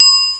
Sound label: ding; kchess